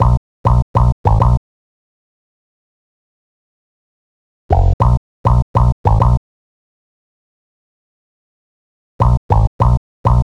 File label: Rubber Bass; Club Joints and Street Anthems; Camalot